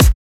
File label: chip kick